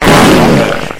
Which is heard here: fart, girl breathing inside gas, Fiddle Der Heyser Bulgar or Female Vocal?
fart